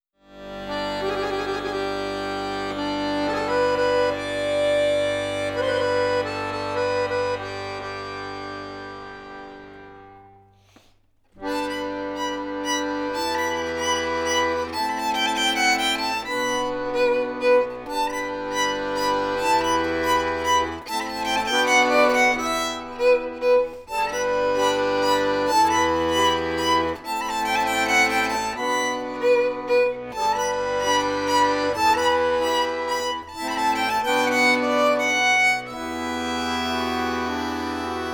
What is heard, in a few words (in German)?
Zwischenspiel